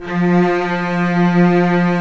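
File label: cellos f; Roland LCDP13 String Sections; STR Vcs2 f vbx5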